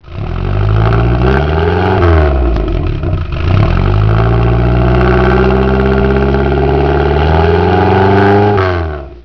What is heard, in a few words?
mga 1600